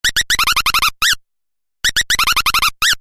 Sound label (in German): buchfink, Vögel; midi; Polyfonisk musik; siemens